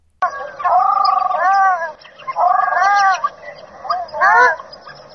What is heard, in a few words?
ruddy shelduck, Birds